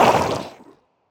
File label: SWARM ENEMY death; Swarmfeeder